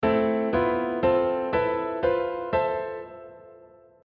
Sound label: Am pent (hirajoshi aeolian) - harmonized